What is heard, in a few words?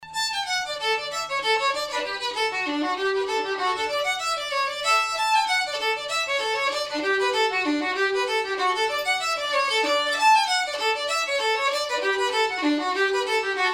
hornpipe, fiddle